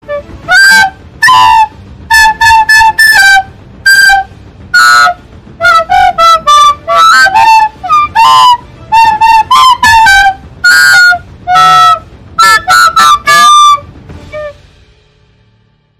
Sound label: recorder fail; Pirate